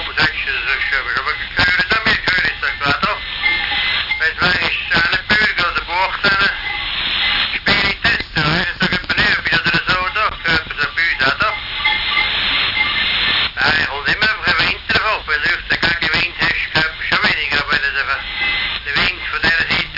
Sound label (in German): CB Funk K08